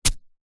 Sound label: warfare knife dig into grass mud deep